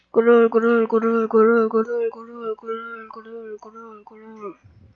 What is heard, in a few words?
growl